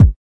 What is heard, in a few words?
kik